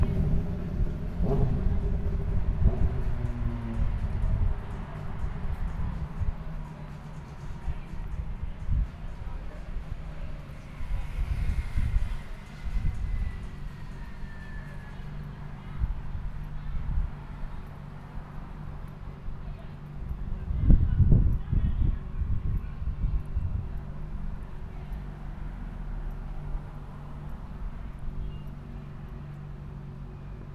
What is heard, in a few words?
London Elephant and Castle outside outdoor food and drink place motorbike waiting